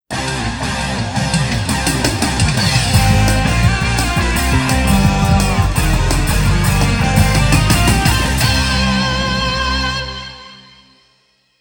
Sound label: Stage Clear; pulstar the definitive soundtrack 2019